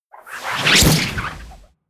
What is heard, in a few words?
swoosh